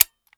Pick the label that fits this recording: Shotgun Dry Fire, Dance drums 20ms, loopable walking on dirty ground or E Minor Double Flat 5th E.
Shotgun Dry Fire